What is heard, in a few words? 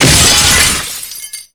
glass crash